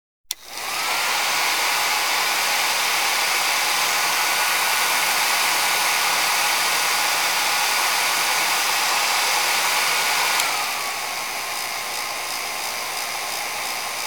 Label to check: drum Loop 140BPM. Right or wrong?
Wrong